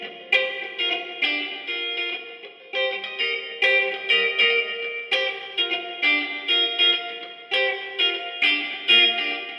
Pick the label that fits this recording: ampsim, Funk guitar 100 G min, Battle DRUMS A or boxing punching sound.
Funk guitar 100 G min